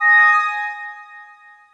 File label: Text Feedback